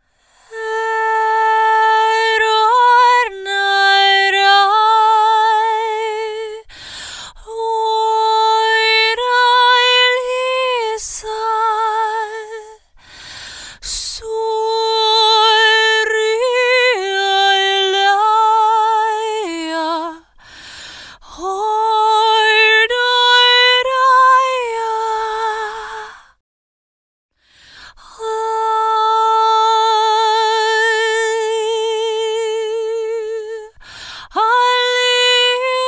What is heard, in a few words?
Top Line Vocal - Am 70Bpm; Construction Kits; VOCALS; Ghosthack; SkyRealm; Kit 01 - SkyRealm